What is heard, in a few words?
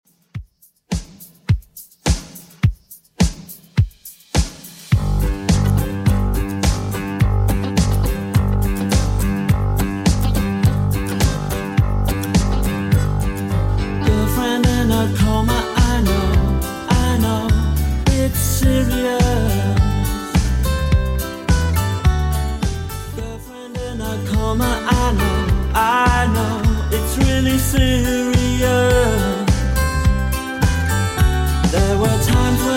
80s Redrum 105 bpm